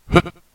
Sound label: jump